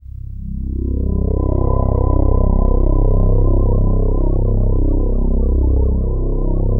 DENSE FLOO, PADS; Masterbits; Vintage Voltage

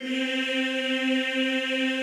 EEH C3, Extended Classical Choir; Best Service

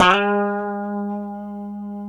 A 3, Guitars; Spectrasonics; Hans Zimmer Guitars VOL-1; 90's Sample CDs; COMP POP+VIB

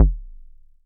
kicks; FutureRetro FR777

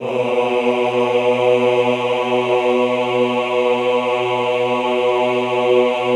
AHH B1, Classical Choir; Best Service; Extended Classical Choir; AHH LO+HI LL

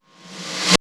SFX REV R; Crypton - DrumBase5000; FX PERCS

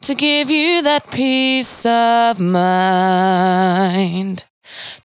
92bpm Bb, Velvet Vocal; Zero-G

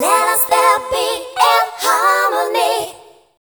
FEMALE CHOIR, Dance Vocals; Best Service ProSamples vol.12; AKAI

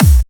Clubby Kicks, Bassdrums

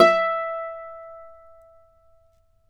Celtic Harp, Plucked Strings

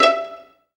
STR PIZZ, STR Pizzicato

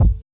130 UK BD, Ragga & Dub Reggae; USB Soundscan vol.27; AKAI

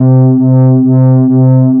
MOOG BASS C4; Best Service Dream Experience

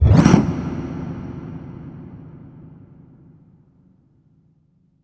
IMP WHOOSH; Cinematic Impact

